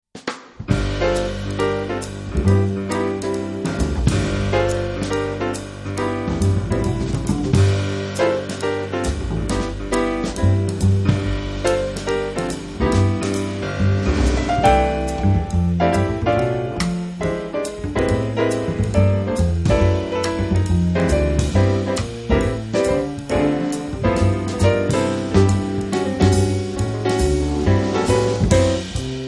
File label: samba jazz trio